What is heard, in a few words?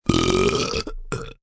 Burp Growl, Burp, deep growl, long (human voice)